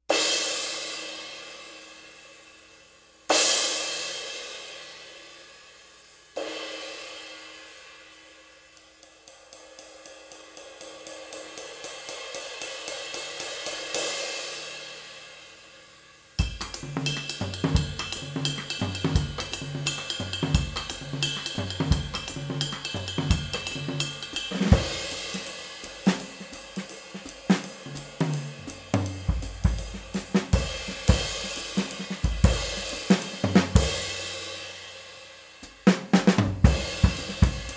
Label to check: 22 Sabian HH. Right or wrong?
Right